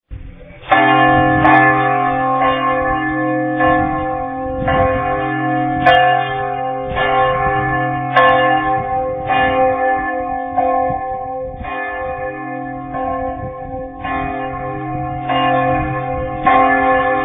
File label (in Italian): Campana Grande; Campane Dardago